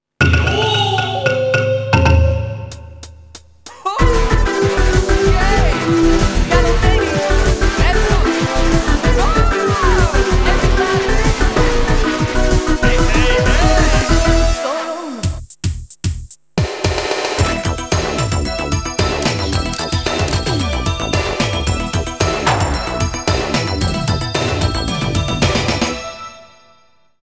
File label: Battle Contact; Persona 2 Innocent Sin